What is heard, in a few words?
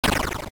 mannequin death; project hawk